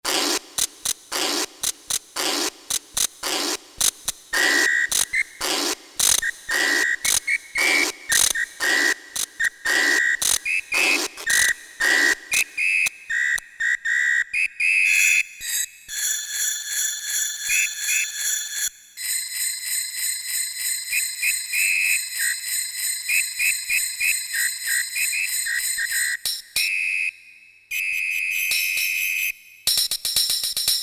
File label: Perc Kit